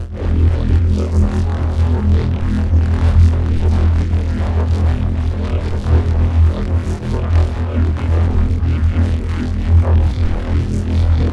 Bass Granulize E2

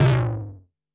Tom Lo, Korg MS-20 Drums

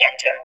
vox, electro vox

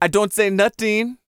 REGGAE, Vocal Planet World Voices; ILIO; Vocal Planet VOL-5

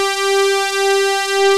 MULTI SYNTH

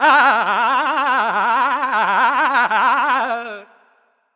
Vocals Male World Pop; Sonic Foundry (Sony Creative Software)

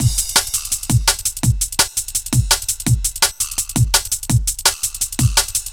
Break, Jungle; Breaks

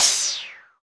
FX, Roland; Sound & Vision - Gigapack II

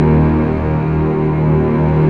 STR, Orchestral Strings

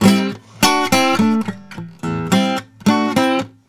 Blues Guitar; ILIO; Fretworks

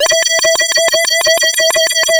OSCAR F2, Rare Synthesizer; Hollywood Edge - Giorgio Moroder Rare Synthesizer Collection

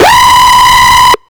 EL SCREAM, Hard & Loud Techno; USB Soundscan vol.01; AKAI

